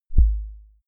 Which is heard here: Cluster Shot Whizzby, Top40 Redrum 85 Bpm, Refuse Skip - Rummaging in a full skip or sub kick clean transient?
sub kick clean transient